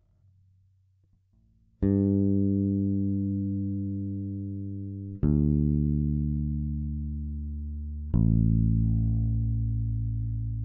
Phil Jones EQ E-Bass High Regler

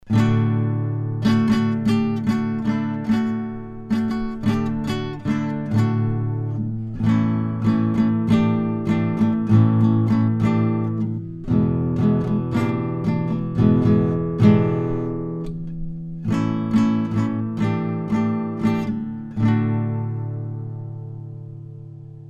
Gitarre clean

